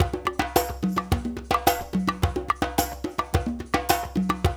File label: LOOP R, Percussion; InVision Interactive; Percussion Slam; PROGFROG